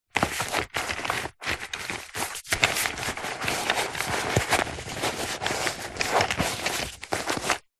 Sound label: household makeup bag thick movements handling